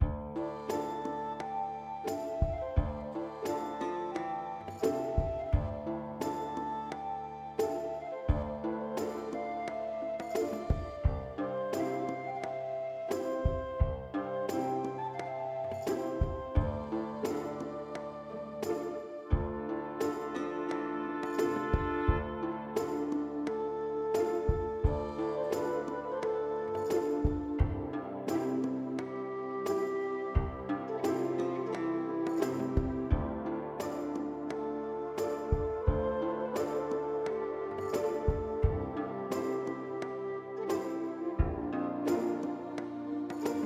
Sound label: background; Evoplay; Heads Or Tails